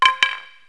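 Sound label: drop sticks; Wired for Sound Pro CD; Aristosoft, Inc.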